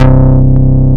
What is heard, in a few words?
Bass Note C